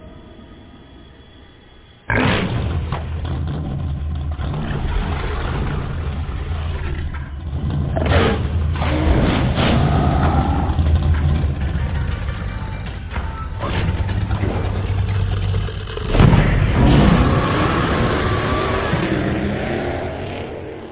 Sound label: war machine sound